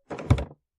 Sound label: vent lever; dashboard accessories